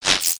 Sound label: Spiderling Stunned Struggle; creatures mechanical